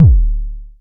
analog tonal, Kickbank

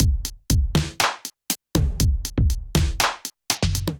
Kit, Drums Hybrid; SELECTOR Kit Producer; Ableton; Factory Packs; Drum Essentials

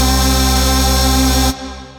Synth Lead; Massivoice; Ableton; Factory Packs; Build and Drop

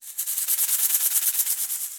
Caxixi FX; Latin Percussion; Drums; Drum Hits; Shaker; Ableton; Factory Packs

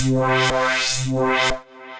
Warped Mind Synth, Synth Rhythmic; Ableton; Glitch and Wash

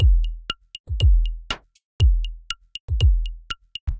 Analog Face Kit, drum racks; Ableton; Factory Packs; Mood Reel

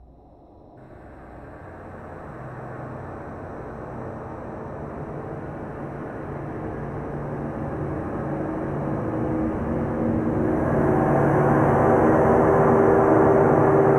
Dark Ambience 120 bpm; MIDI Clips; Tonal; Atmospheric; Ableton; Factory Packs; Mood Reel

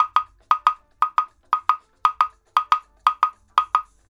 117 African Ekwe; World; African Percussion

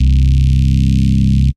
Analog Bass; Hair Raiser; Ableton; Factory Packs; Retro Synths; Analog Bass Vol 1

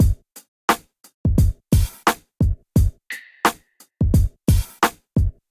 Kit, Hip-Hop Drums; Peach; Golden Era; Ableton; Sound Oracle

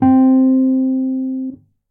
Basic Wurly Speakers, Electric Keyboards; Wurly Piano; Ableton; Factory Packs

